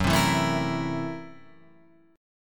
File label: Gb 7th Sharp 9th Flat 5th Gb; strummed; Gb7#9b5; x,x,4,5,4,4,6,7; JGuitar